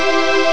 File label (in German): string dur; ST-XX Amiga Soundtracker Sample Packs